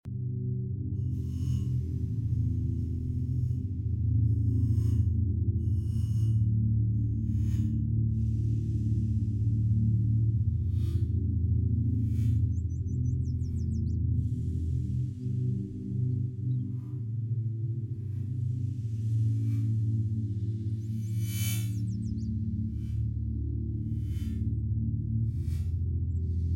Room Ambience; Voras; Bite the Bullet

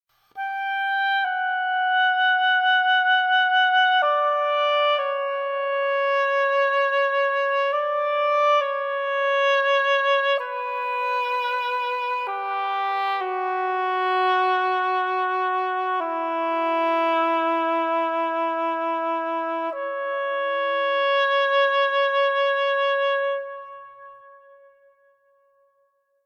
Loop, Oboe Stem; ps4 ps5 switch windows xbox one xbox series xs

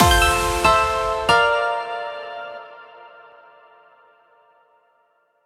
Win Switchfloor; Glitch Hero

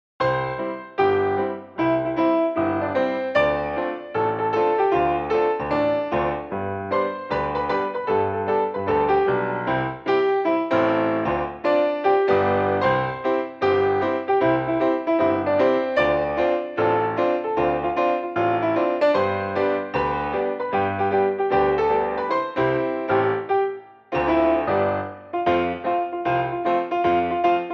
Song Bilby Piano Mix; baron fur is gonna fly; switch windows xbox one

